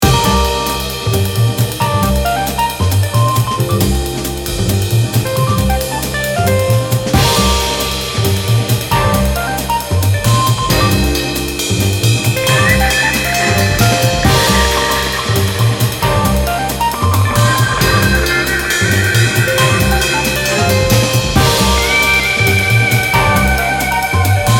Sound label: Electric Jazz